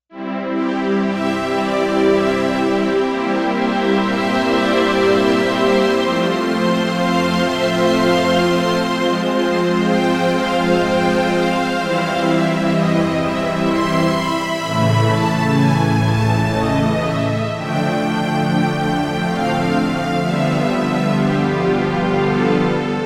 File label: ENS ASCEND